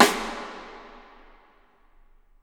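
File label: snare drum rim, left; Miroslav Vitous - Percussion & Harp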